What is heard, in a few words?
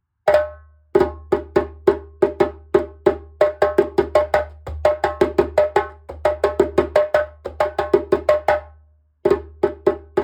djembe; guinea djarra 32cm reused